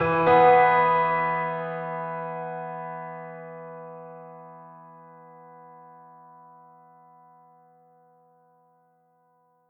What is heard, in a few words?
piano theme modular hypothesis; The Detail ios linux macos windows gamerip 2014